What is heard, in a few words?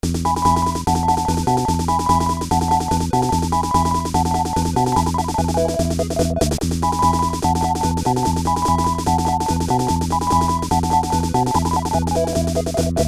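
countdown music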